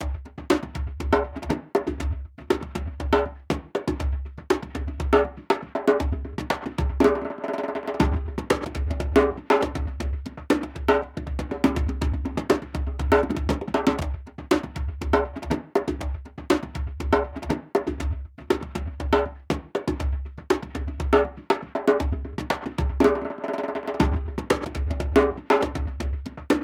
Djembe; raji an ancient epic

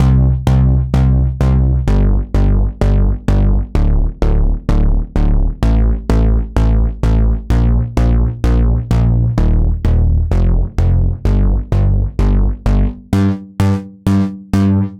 Poly Bass

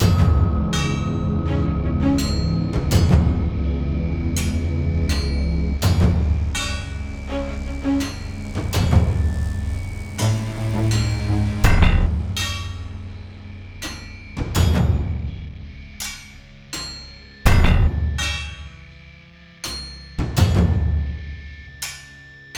Formation

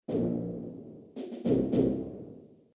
Sting

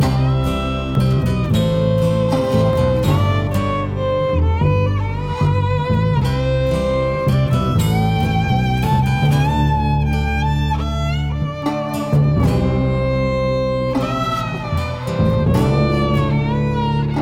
Country Fried Cabin (Lose); Cooking Dash

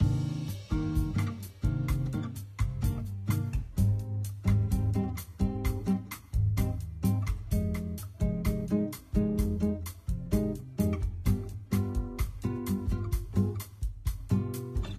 Loop Bossa Guit Bateria

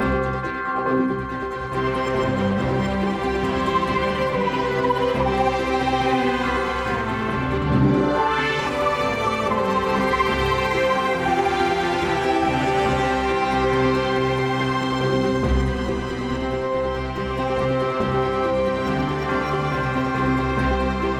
Player Victory

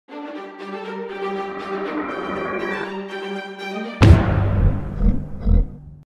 Cinematic Music; Hangman

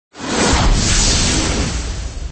skill start; Endless World Idle RPG

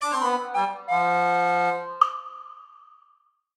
Intro Shock; Everybody 1-2-Switch!

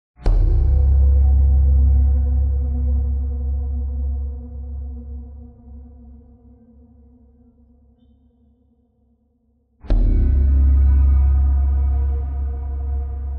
Amb Level; underworld ascendant

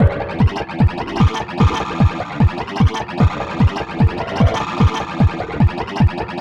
Clockwork Machines B - Standard

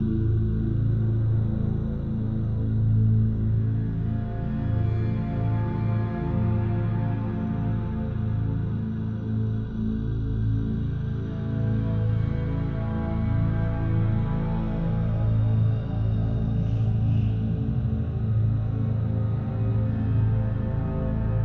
Quest Belfry; Eon Altar

